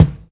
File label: 00s thumpy kick drum sample a key